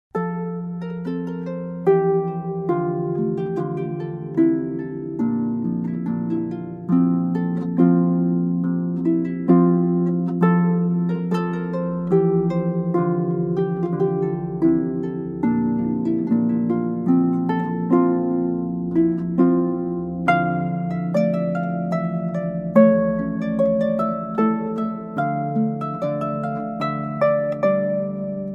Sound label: Hornpipe